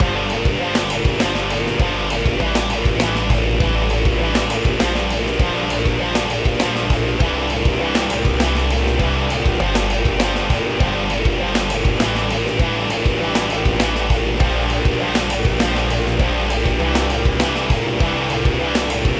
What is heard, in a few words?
loop, Cinematic Battle Music; Bossing Around